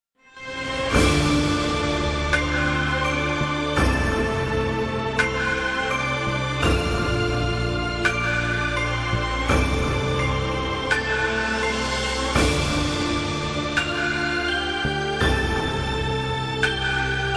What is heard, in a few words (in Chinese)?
pian tou yin le